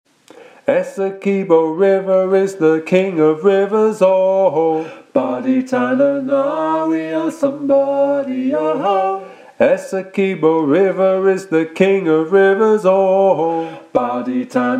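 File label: Essequibo River - soprano, sea shanty teaching track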